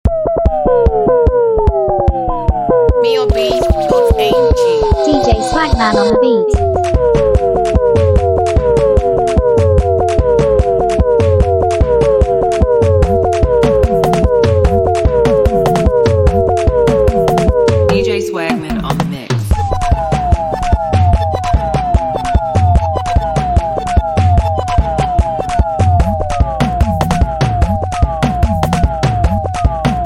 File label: TikTok Mood Mara Beat